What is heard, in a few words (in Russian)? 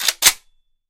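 заряженный для стрельбы пистолет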